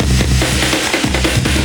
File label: drumloop; awe32